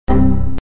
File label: windows error sound